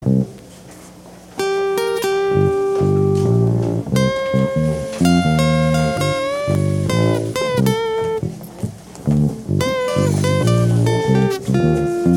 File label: awesome guitar instrumental ringtone